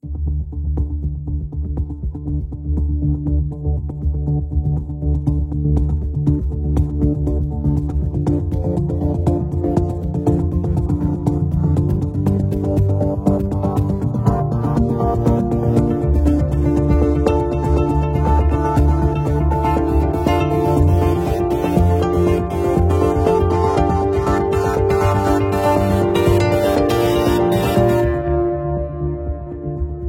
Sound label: Piano; 钢琴; Piano Colors; Komplete